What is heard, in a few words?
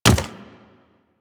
Chimera Step Default; Warframe; Ballas Chimera; Steps